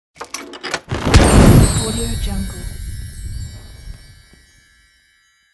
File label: opening magic chest